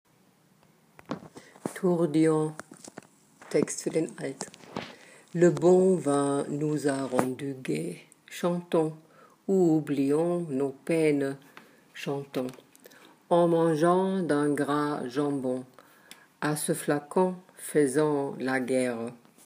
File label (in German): Tourdions Text für Alt; singen